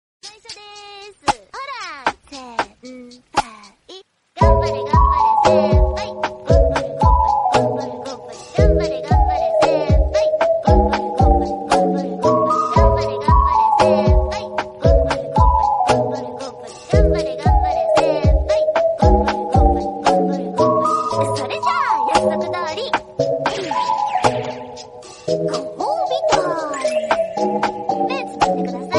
korean remix song

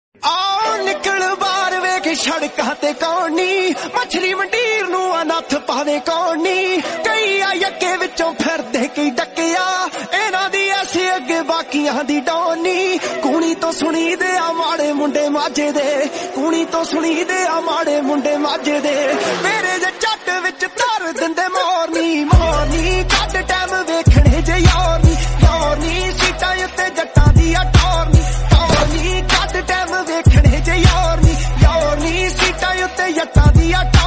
Punjabi song

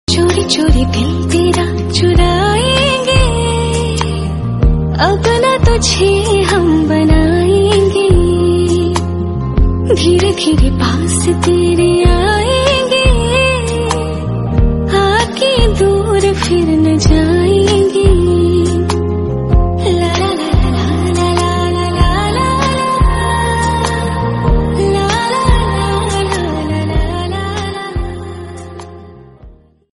female version

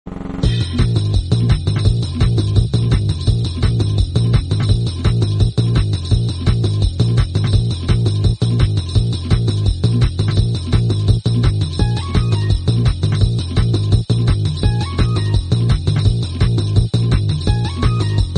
trance music trance

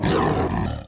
tiger roar notification

